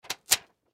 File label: Check Rip From Check Book Paper; Office; Blastwave